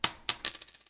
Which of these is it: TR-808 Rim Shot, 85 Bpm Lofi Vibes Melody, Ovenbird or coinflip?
coinflip